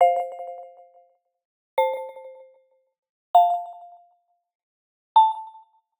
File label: Arcade Click Positive; boom library; The Interface